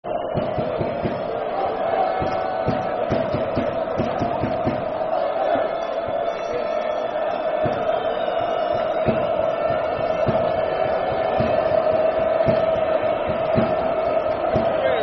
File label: Goal Kick, Football Chant (Soccer Song), N. Ireland